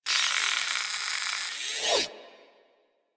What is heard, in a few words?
Arsenal Working Loop; Warframe; Liset; Hub; Machines; Tenno Arsenal